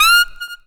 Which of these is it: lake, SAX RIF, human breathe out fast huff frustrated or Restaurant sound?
SAX RIF